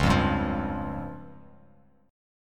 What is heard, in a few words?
Db Major Flat 5th, Bass Standard; strummed; JGuitar